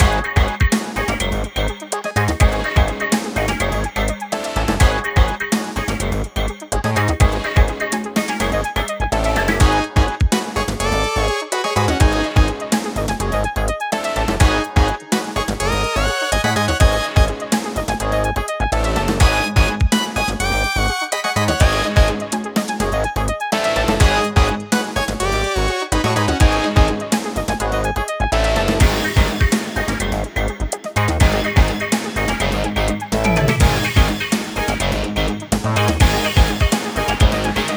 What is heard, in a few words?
125 bpm; fashion police squad beta